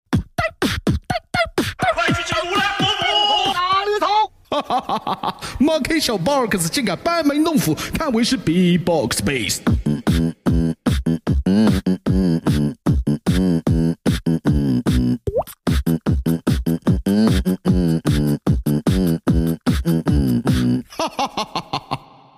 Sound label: Bbox bass